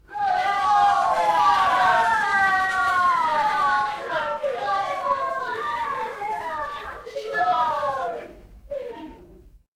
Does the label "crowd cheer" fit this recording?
Yes